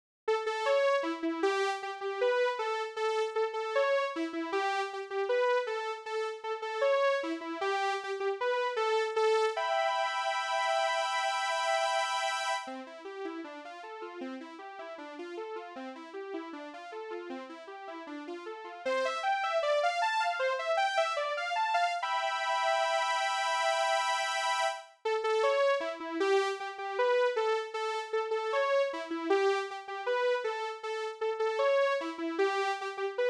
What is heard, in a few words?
arpeggios